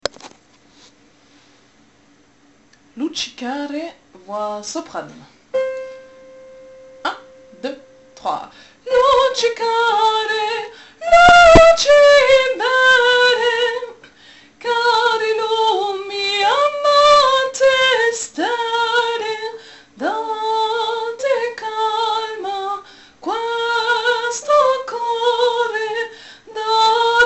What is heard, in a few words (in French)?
nocturnes sopranes; luci care